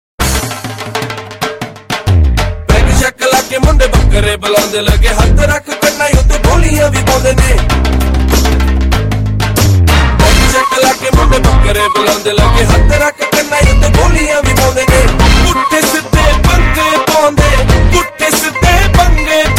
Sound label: Nice Bhangra